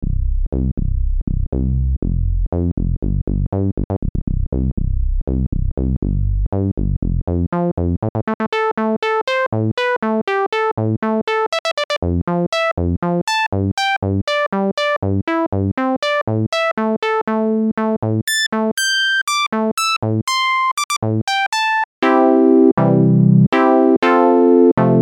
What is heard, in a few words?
reaktor 5 vsti filter lpf 2pole q050; native instruments